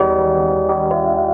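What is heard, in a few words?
KEYS loop R, Drum & Bass 175 BPM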